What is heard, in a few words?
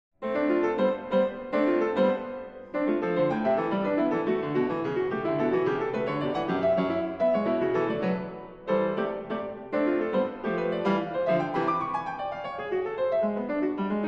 Piano Sonata, Allegro Risoluto; 20th Century American Piano Music